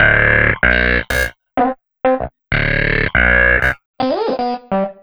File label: Acid Squelch, Bass; Sonic Foundry (Sony Creative Software); Synchro Funk